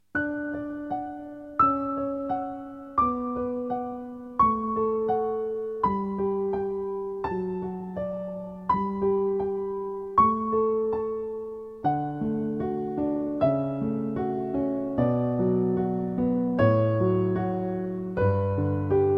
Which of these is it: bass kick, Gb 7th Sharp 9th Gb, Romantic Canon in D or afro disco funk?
Romantic Canon in D